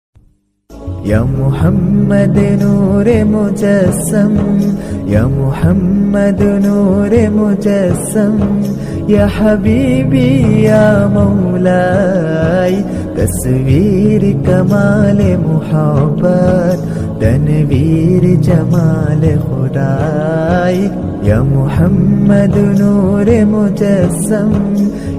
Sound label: islamic ringtones